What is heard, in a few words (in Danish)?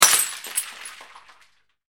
glas knuses